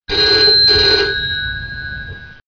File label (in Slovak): Telefon; Domacnost; Revelation Sight and Sound SK